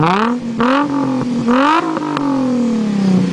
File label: 350z